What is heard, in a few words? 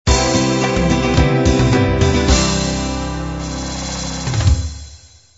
love 8beat end